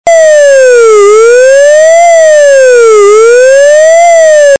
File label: tone